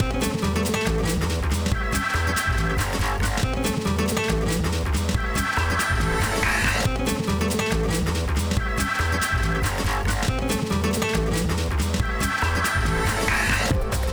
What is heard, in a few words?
Jazz Techno Corporate